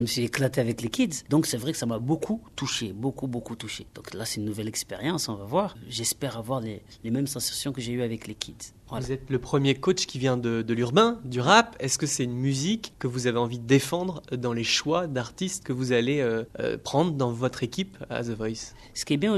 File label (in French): soprano; the voice